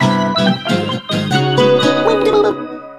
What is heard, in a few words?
HOME Menu Jingle; Chibi-Robo! Photo Finder Original Soundtrack